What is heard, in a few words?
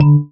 marimba raincoat; ゆめ2っき